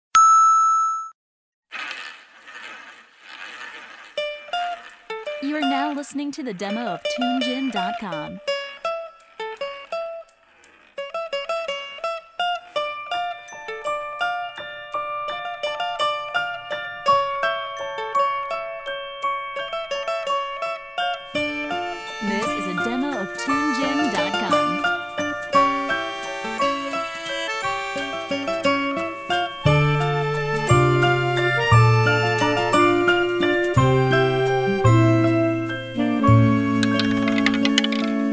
Tango

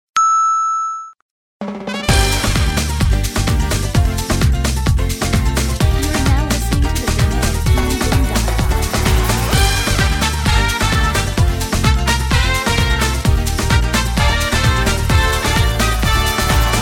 Salsa

